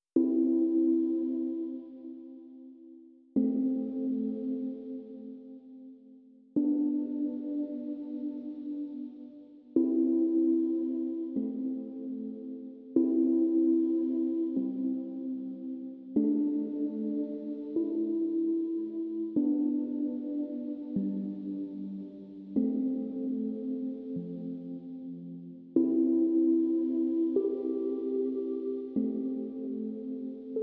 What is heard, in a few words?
Synth